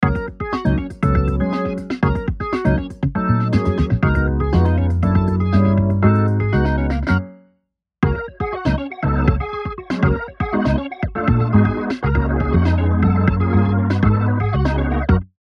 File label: organ